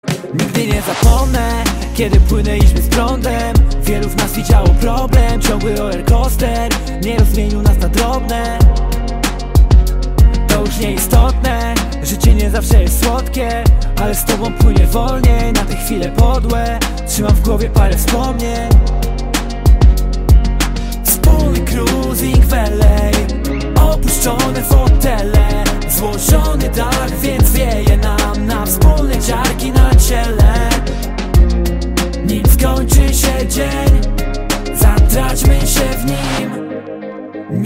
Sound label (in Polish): tromba cisza